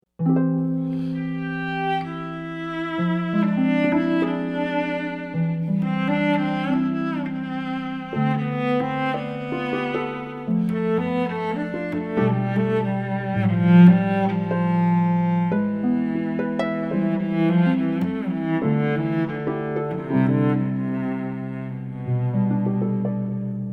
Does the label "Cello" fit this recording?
Yes